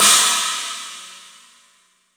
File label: CHINA, HR-16 Drum Mashines; EdgeSounds